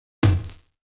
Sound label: battery midtom; Multitrack; Stem Kit